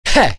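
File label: Vox Attack, English; Nicx; kings raid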